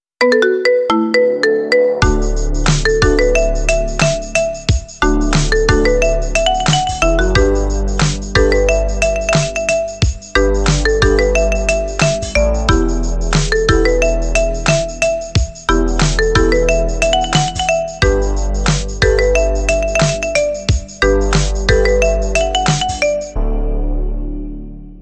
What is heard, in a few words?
Sunflower Marimba Ringtone